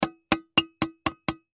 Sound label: Paint Can Full Hammer Lid Shut; Hammers; Tools; Industry; Blastwave